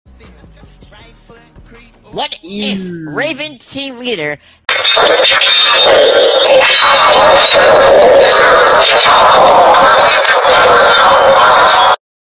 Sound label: glitch; raven team leader